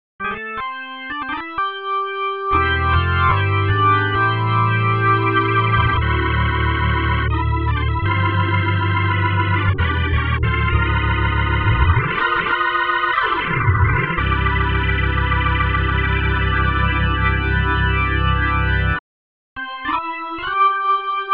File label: Full Drawbars; K-Sounds; Organimation; M3